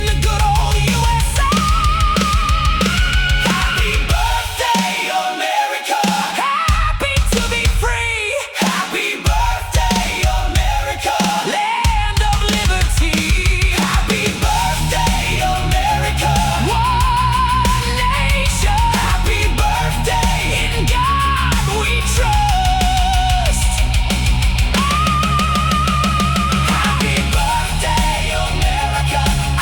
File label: Heavy Metal Birthday Chorus